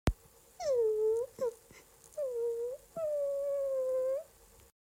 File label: crying